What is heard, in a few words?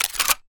Gun Shotgun Pickup